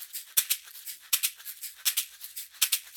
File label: caxixi